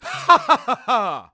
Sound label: "Hahahaha"; Salvatore's game sounds (Japanese versions); Zelda